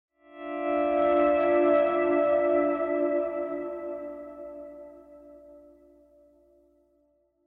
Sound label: Pleasant Guitar